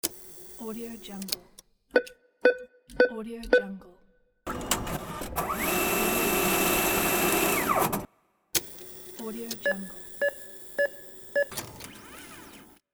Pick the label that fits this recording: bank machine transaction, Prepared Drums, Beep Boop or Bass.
bank machine transaction